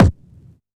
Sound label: Vinyl Kick, drum